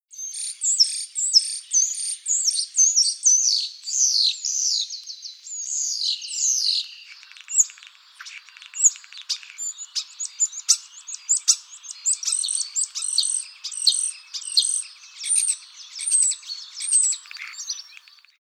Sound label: Sturnus vulgaris